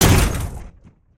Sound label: collide, vehicles; aronwk collection; ndaudio